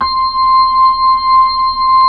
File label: B-3, Hollow; Keyboards of The 60's and 70's